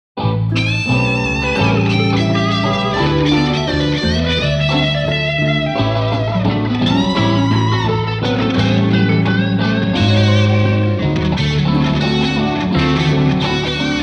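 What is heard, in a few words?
Solo Guitar + Bass + Dobro + Rhythm Guitar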